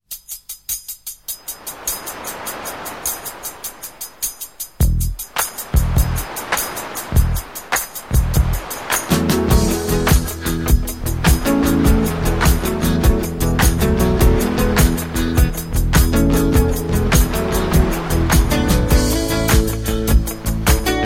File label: crying